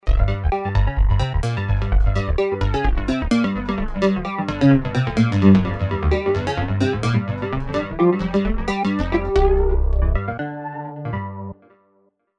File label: Flango Square, Roland V-Synth; Factory Patches